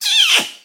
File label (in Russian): orangutana